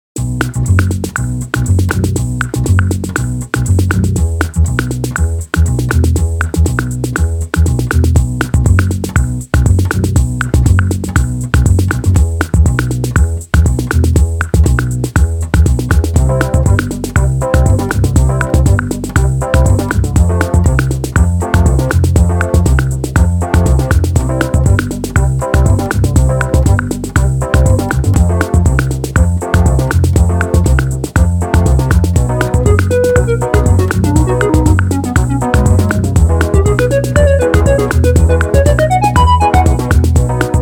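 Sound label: 120 bpm, Rumba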